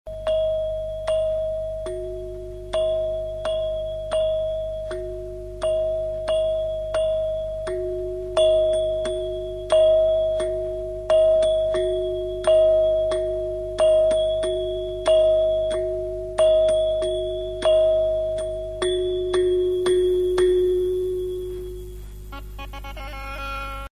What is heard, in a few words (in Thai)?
เสียง khong khu